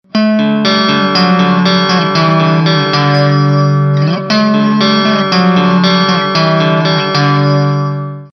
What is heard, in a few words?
guitar fender 65 princeton orange 412ppc; Amplitube 3 5